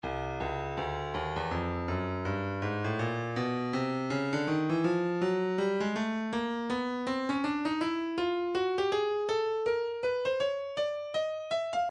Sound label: breakbeat 081 bpm; neck f; Stylus Breakbeat Midi Files